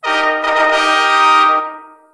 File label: fanfare